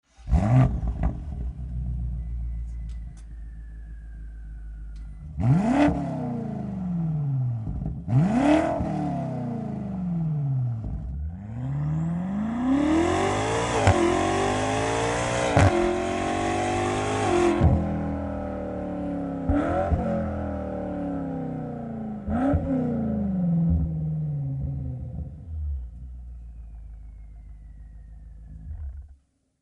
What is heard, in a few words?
LINK PIPE SET BMW M8 COMPETITION OPF